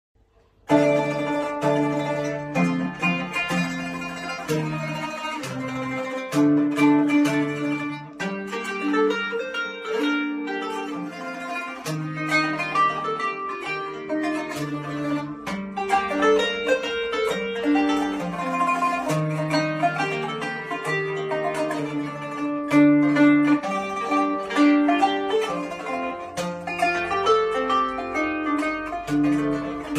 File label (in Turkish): 100 yil marsi kanun